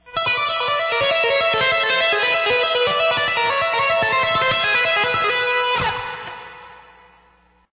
tapping, guitar

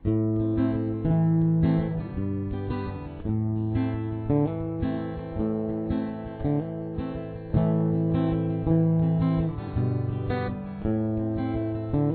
verse2 rhythm, guitar

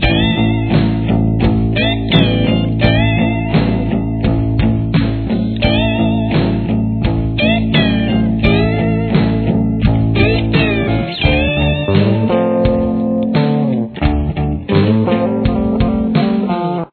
solo main, guitar

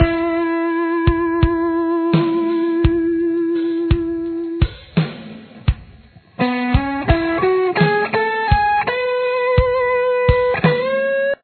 lead, guitar

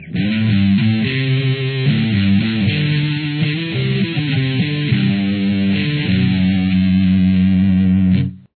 guitar

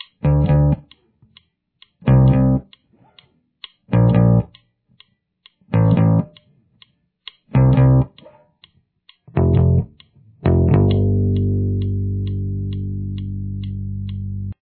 bass

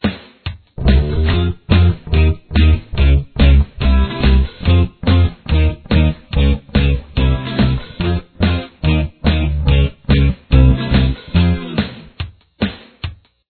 chorus main, guitar

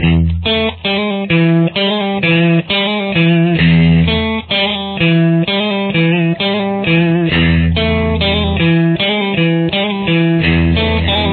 verse detail, guitar

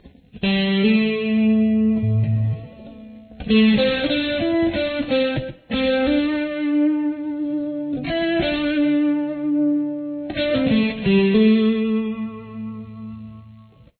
lick, guitar

